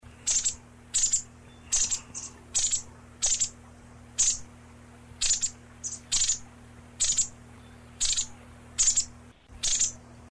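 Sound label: Motacilla alba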